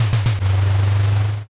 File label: engine start